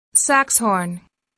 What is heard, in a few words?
saxhorn